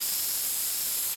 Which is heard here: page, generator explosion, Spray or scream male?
Spray